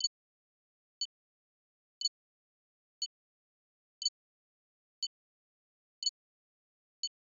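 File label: Crystal Clock Generator Tonal Signal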